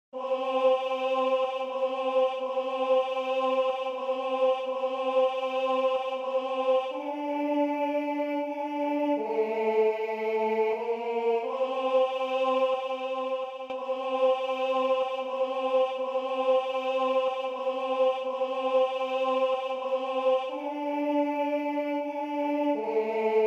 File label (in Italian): Tu sei sacerdote Tenore